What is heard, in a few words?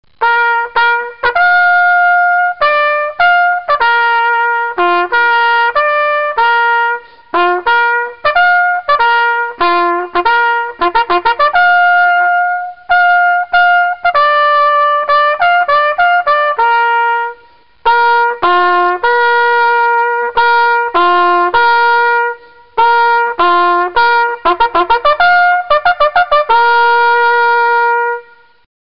Retreat, bugle; BugleCalls